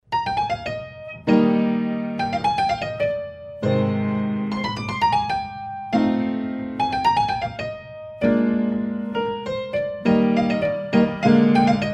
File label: fortepiano; Hino; Antonio Carlos de Magalhaes